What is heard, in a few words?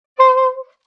A saxophone accent.; Horns; Musical